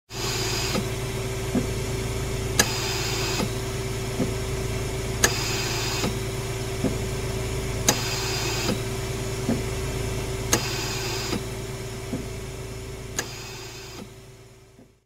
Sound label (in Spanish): ventilador hospital